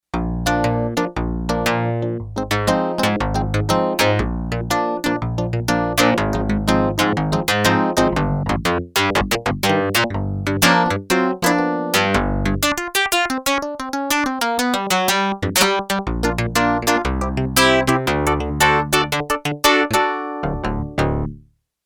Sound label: ClaviPluck, Yamaha TX802; Factory Patches; Voices